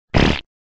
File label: fart sound ringtone